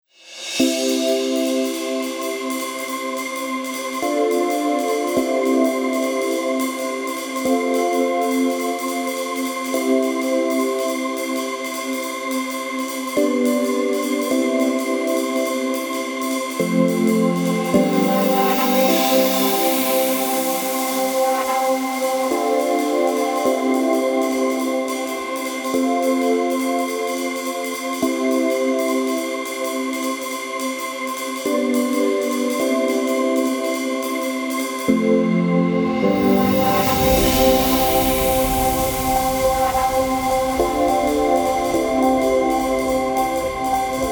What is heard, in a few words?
ambience, Эмбиент-версия (фоновая музыка)